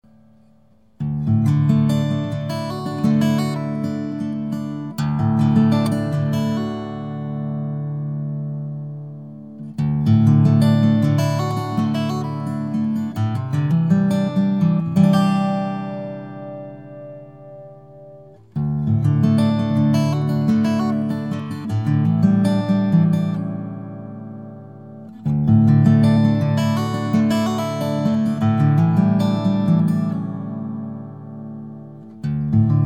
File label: Guitar